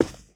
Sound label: carpet, footsteps; player